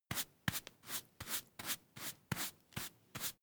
broom hand sweep short sequence

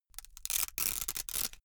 velcro large open slow

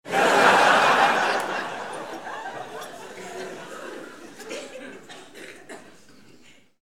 laugh noise

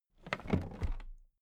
toolbox put down regular

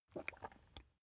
swallow food

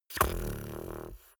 beatbox tongue roll soft

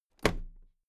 toolbox close drop lid regular

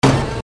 metallic impact

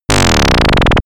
power shutdown

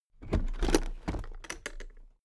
toolbox open heavy

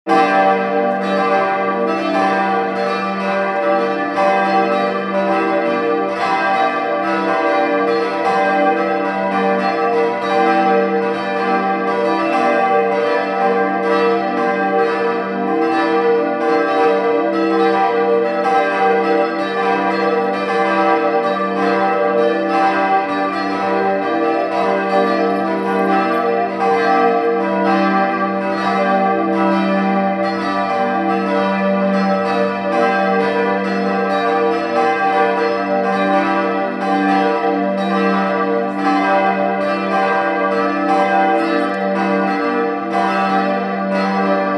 church bells

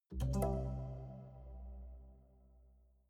haunted strings chord major damped

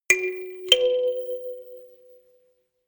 notification kalimba rattle fourth up

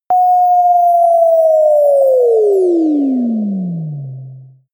sizzle sine drop

